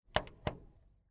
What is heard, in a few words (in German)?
Fernsehgeraet Philips Discoverer Programm wechseln; Fernsehgerät Philips Discoverer